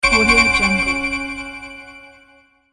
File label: echo gameplay tone